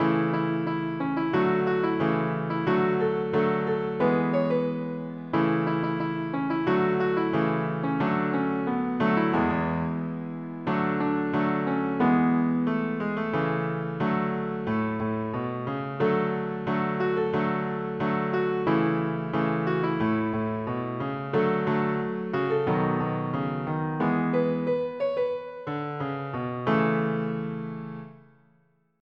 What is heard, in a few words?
piano fast; Sing Joyfully; PSE Music; PlayScore version